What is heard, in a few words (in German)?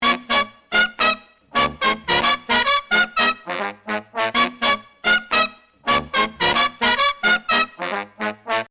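Bläser processed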